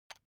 ui button electric drill direction switch lock soft